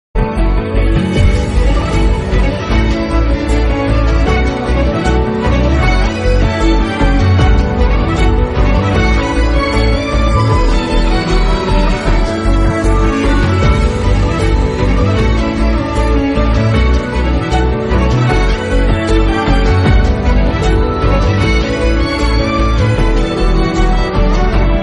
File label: sad bgm; pagal movie